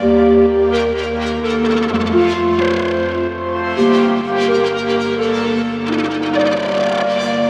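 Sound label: Melodic Loop G minor 128, Melodic Techno; Distribute; Ghosthack; AC2024 - Day 12 - Melodic Techno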